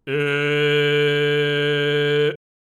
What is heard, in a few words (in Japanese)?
巨人の喉頭のGの声で「え」